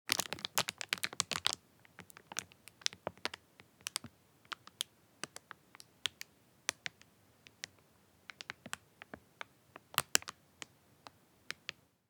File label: Crackling